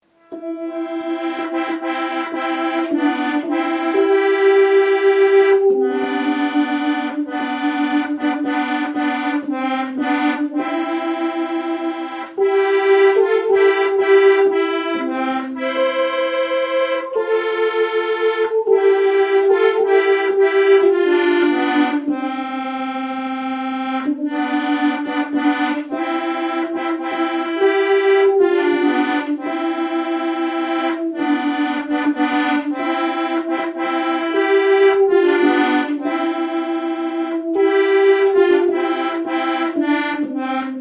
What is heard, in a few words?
Keytar; Susser die Glocken nie klingen